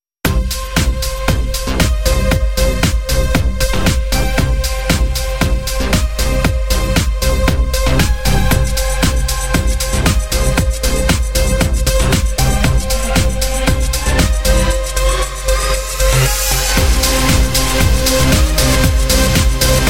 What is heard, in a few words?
reverence electronic